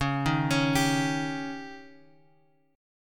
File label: C sharp Suspended 2nd Flat 5th C sharp, chord; C#sus2b5 Chord {X 4 1 X 2 3} arpeggiated